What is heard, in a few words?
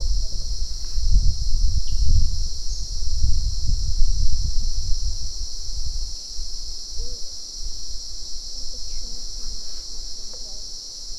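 rain forest cicadas, exterior; ENV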